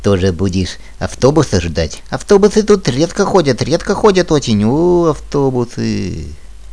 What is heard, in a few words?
folk